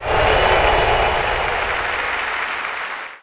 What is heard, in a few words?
crowd normal; Cartoon Network - Toon Cup 2020